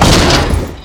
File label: medium crash, vehicles